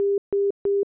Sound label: film slate beeps; blast drive 30; prosoundfx